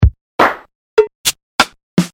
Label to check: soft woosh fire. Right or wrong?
Wrong